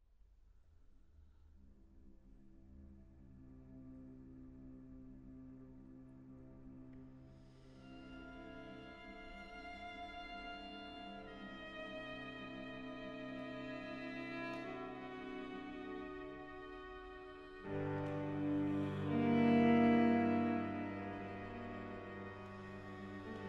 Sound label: microludes for string quartet